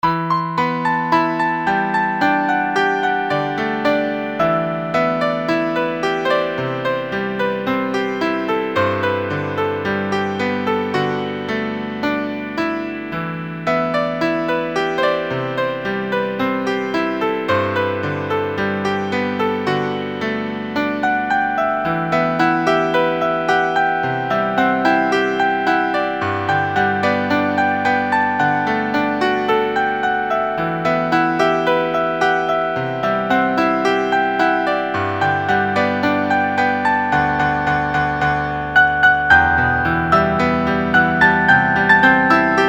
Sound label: piano